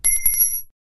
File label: dropping wrench